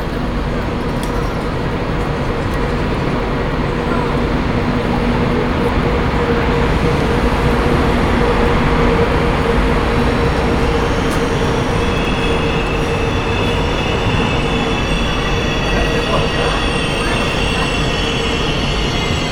Railway Platform, Binaural, Background Noise; SymmetricArray

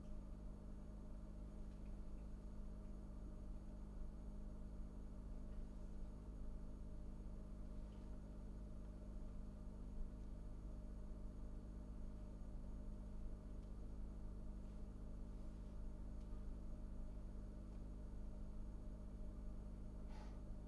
Refrigerator, Interfering noise source; TS 103 504; ETSI

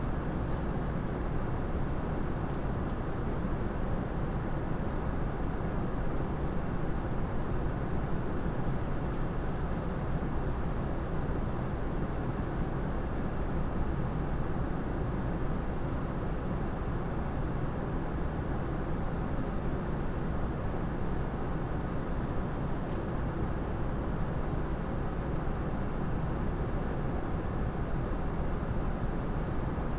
Inside Aircraft binaural, Background noise

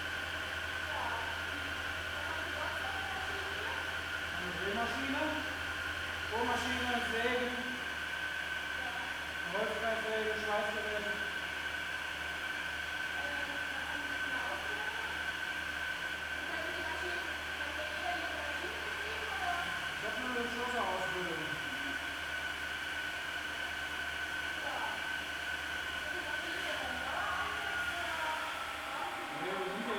Work Noise Machine Shop binaural

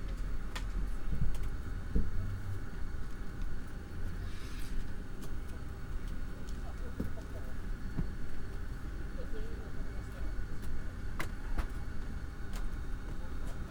Inside Train Noise binaural

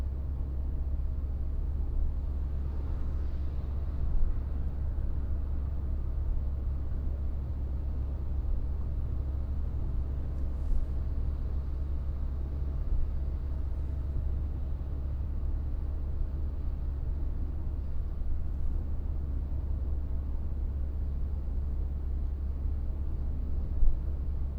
Fullsize Car 80Kmh, Background noise

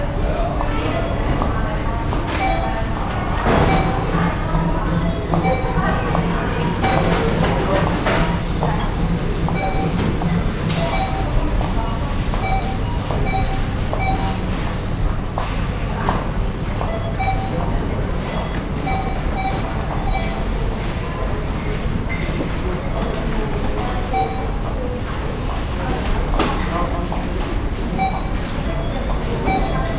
Sales Counter binaural, Background Noise; TS 103 224 Background Noise Database

